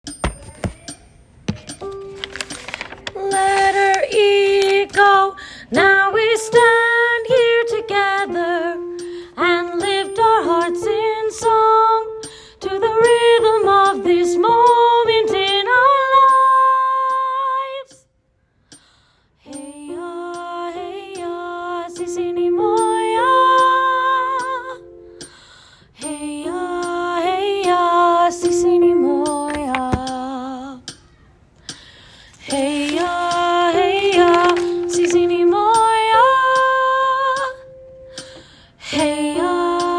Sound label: soprano